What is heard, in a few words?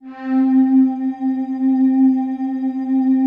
PAD, DIGI-PADS